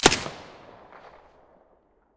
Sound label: mp5 silencer stereo, weapons